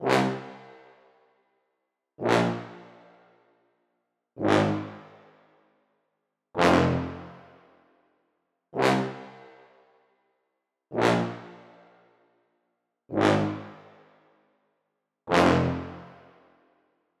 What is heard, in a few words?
Bpm110 C Horns; TheEdge